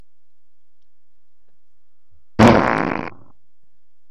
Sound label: funny short fart